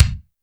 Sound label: kick, Roland R-70, BD